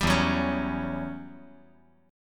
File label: E Minor 6th Add 9th chord